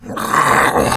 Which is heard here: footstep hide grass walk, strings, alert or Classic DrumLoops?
alert